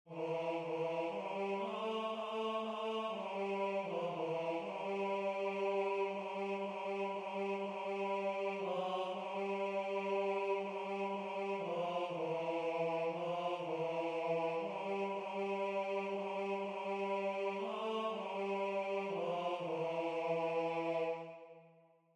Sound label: Tenor